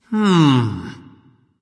thinking; smite

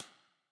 clap; smite; arachne skin9

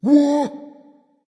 confused dragon; fafnir

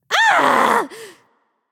ouch; Smite; awilix

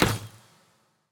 roman ranged bow fire; smite; npc; roman archer

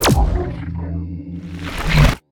portal open; smite; janus

